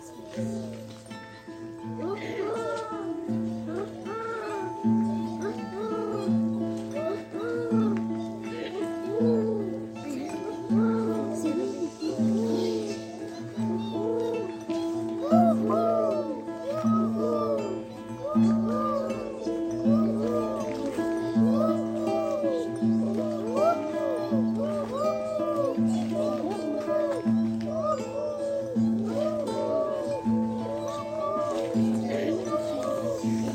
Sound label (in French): paysage sonore l eveil des oiseaux